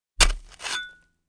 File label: shovel